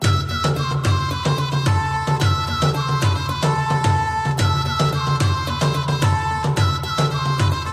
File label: Samsung S22 Ringtone